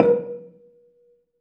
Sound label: BELA A#3, Asian Traditions; USB Soundscan vol.60; AKAI